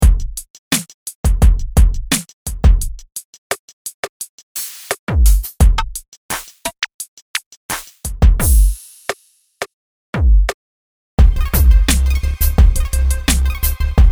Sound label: adept machine, ultrabeat; logic pro